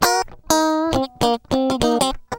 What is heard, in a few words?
GUI, LICKS